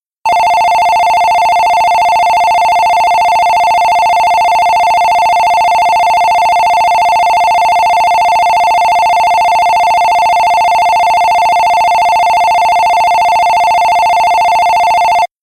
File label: Bell A